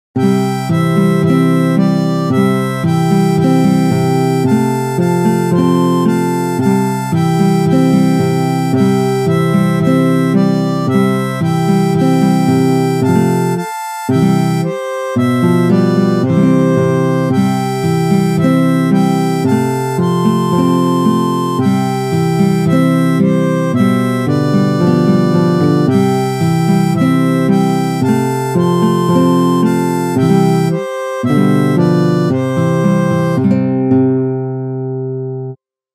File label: Harmonica; Jesus Loves Me